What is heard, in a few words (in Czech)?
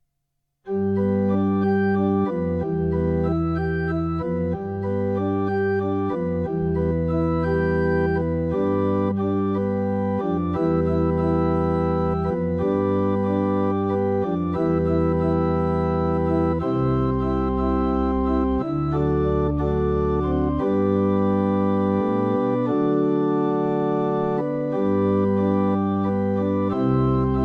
Haleluja varhany